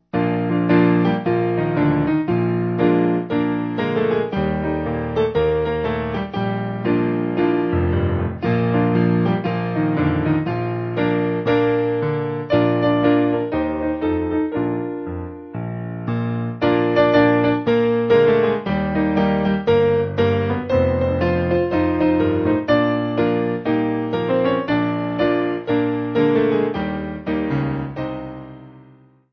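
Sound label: Piano, Music Backing Files for Churches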